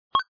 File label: Button Clicked; Shop Empire 2